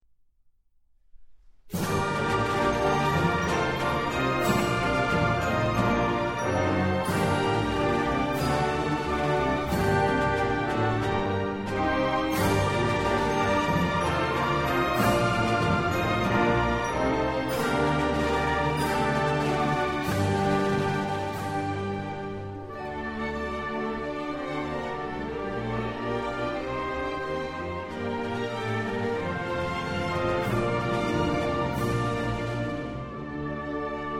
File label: Anthem of Ukraine instrumental